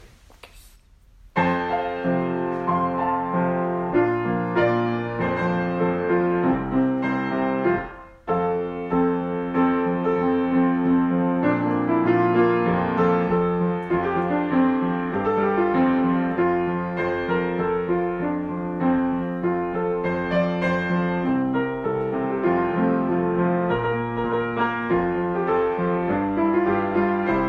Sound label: piano